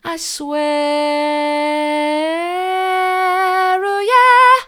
i swear soft, vocal

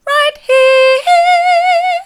right here mid, vocal

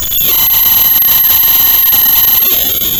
Sound of a cat meowing

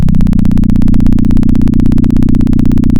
Sound of a plane taking off

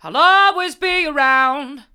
i'll allways be around hard, vocal

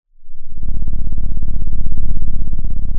pop, Sound of an engine revving

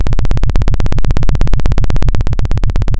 Sound of a helicopter

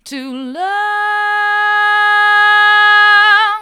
to love hard, vocal

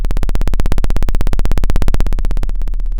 Sound of a plane taking off

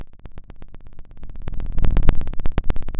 pop, Sound of a fire crackling

